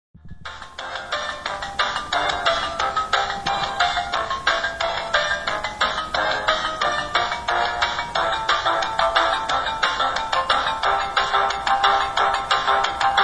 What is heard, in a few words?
crash